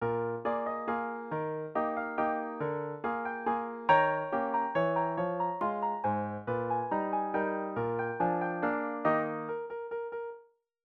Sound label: waltz